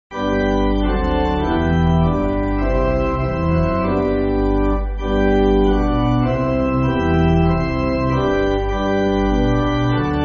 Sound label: Pipe, Music Backing Files for Churches; Jesus Is The Most Beautiful; Popkes; E.F. Popkes